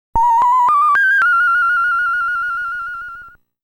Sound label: Flute BGM